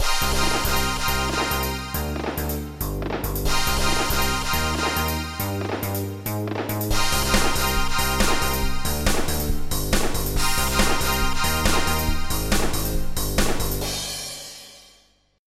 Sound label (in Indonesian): Nada Dering Nokia Jadul; Strike